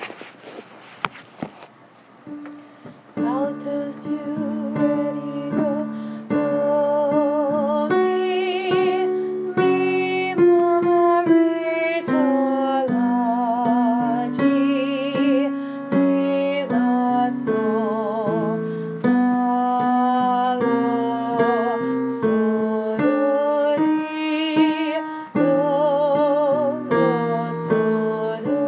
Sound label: alto solfege